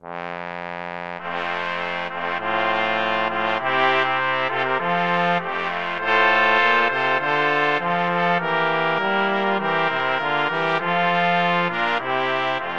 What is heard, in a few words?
Fireworks Overture BRASS, brass quartet